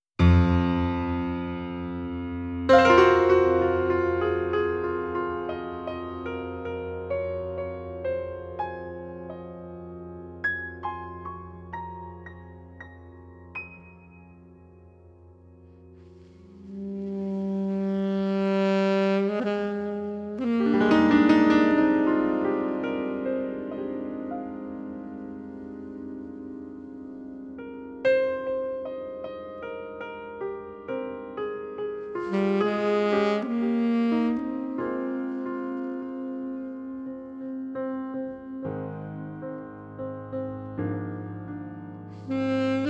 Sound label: Piano, Alto Sax